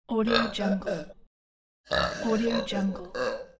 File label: burp burping